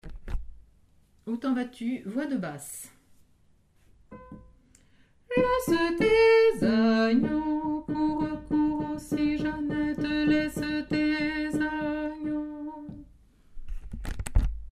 basse; ou ten vas tu

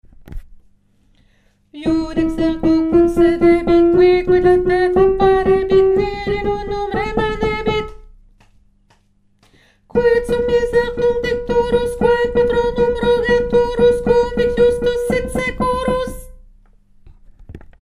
dies irae lettre I tenor

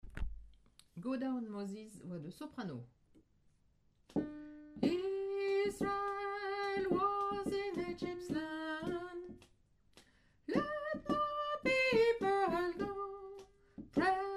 soprano; go down moses

